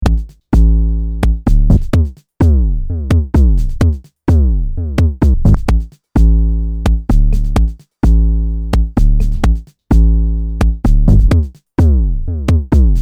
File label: LFO vs. BD